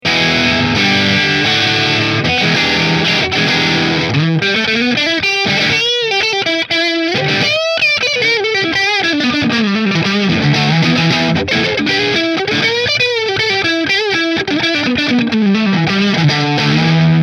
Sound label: Middle Marshall; Aria Pro